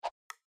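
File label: hand movement general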